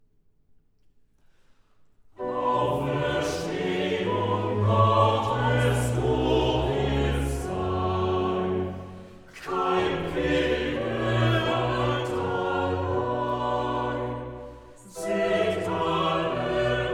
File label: Chorale